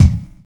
Kick Drum One Shot F Key, Royality free steel kick drum tuned to the F note; kick drum samples